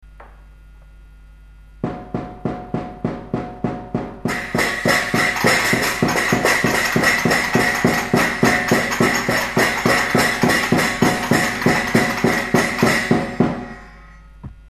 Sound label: instruments